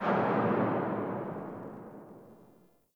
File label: Under City Alley Cell Door Slam, Dungeons